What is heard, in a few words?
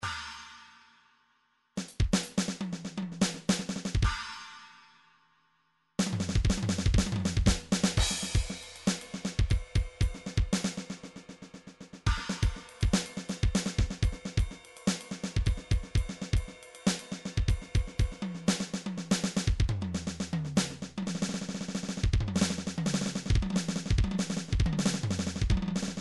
drum solo